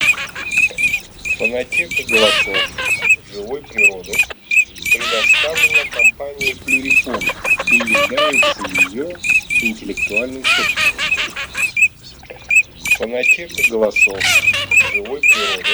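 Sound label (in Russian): Чирок-свистунок Alzavola, манок; plurifon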